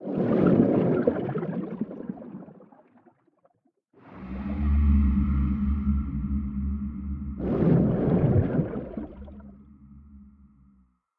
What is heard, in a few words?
creature eye interaction; glowwhale